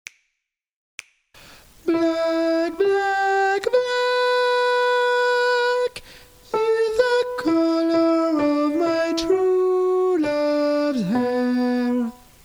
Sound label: black is the colour alto